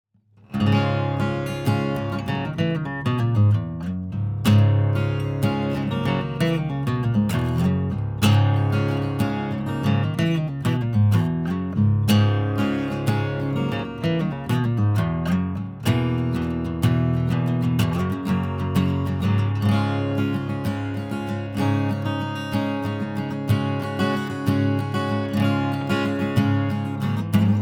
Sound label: DROP D